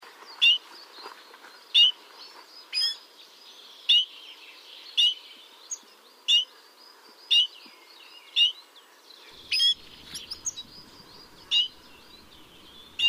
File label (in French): orn venturon montagnard